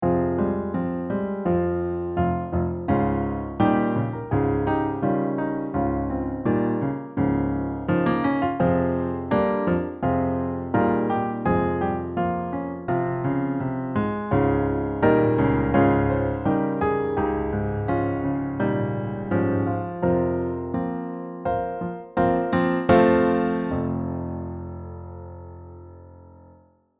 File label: ACCOMP